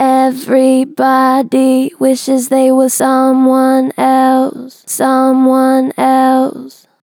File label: Gentle Vibrato "Everybody wishes", Vocals, Natural Vibrato Gentle; Harmor Vocals